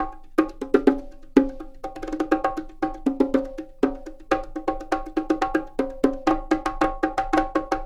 Conga Loop

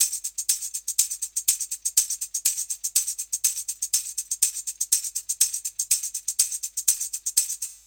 Tambourine

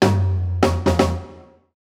Roll 20

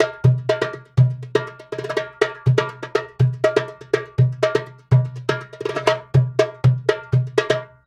Djembe Loop

